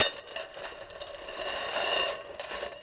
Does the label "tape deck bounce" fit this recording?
No